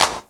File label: clear hand clap sound G# key